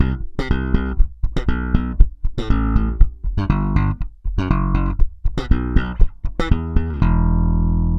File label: SM BS EM, BASS SAMBA